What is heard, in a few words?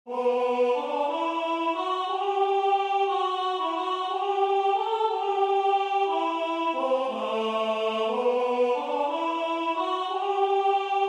Alleluia, Alt